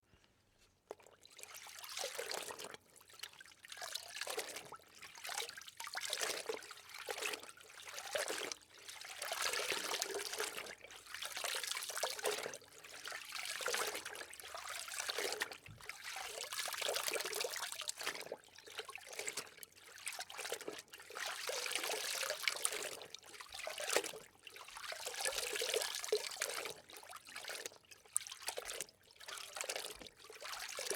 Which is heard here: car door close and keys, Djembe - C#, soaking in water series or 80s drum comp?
soaking in water series